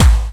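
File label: snare drum bass drum, Dance Mega Drums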